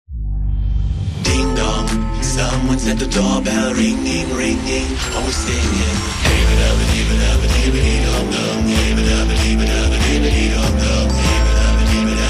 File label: Ding Dong Ringtone